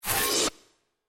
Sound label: Grass; Qiyana